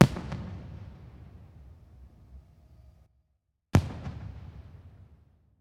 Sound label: Fireworks